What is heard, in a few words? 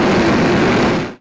flame; brainbread